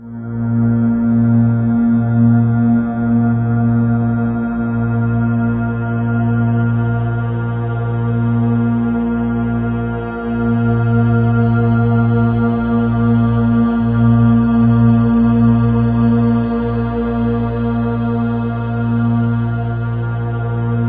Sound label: Ominous Drones, SYNTH SND; USB Soundscan vol.48; AKAI